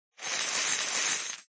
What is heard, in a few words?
win bet